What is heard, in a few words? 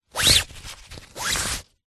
fabric nylon cloth movement in hand scratch; zapsplat